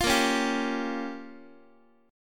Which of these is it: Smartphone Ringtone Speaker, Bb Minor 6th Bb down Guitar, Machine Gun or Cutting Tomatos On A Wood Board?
Bb Minor 6th Bb down Guitar